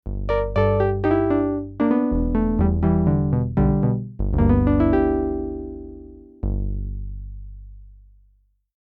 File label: Pianolike Juno6